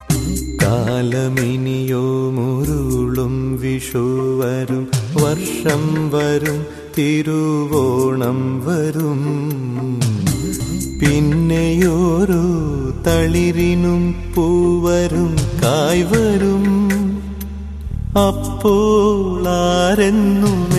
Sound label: malayalam kavitha ringtone